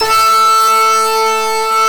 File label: SWEEPS; NorthStar - The Wizard VOL-2; TURBOTRONIX